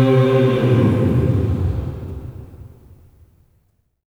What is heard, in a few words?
OCT FALL, voices; OCT.FALLS